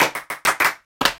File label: Percussion Fill 100bpm